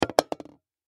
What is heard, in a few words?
Aerosol Can Drop Knock Over On Counter; Objects; Foley; Blastwave